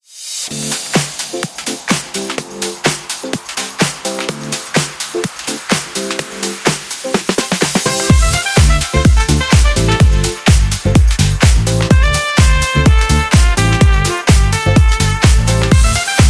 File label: Saxophone